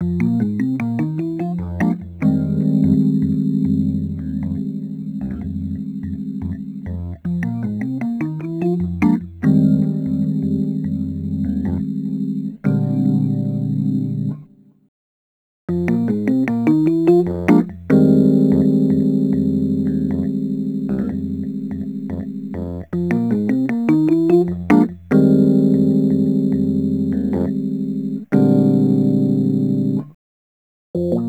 Rhodes Attacks; Ueberschall

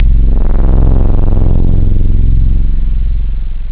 long bass; ST-XX Amiga Soundtracker Sample Packs

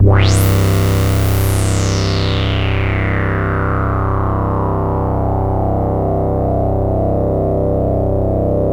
DRONE C1; Best Service Alpha Dance vol.2

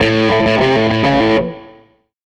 SG Guitar Loop

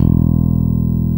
5 STR P BS E2, PICK BASS

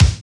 KIK, Kicks; AMG; Now CD-ROM (Roland); NOW! Drums

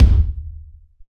Kick, Drum & Bass; Kicks; AMG - Prototype Drum & Bass

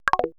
PERC MIX; Best Service ProSamples vol.55 - Retro Sampler; AKAI

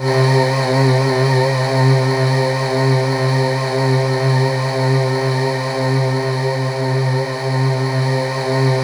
C3, Synth Leads; 90 s Sample CDs; NiceBeats (NiceBytes); Studioline 09

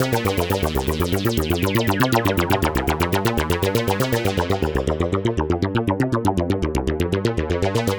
Synth

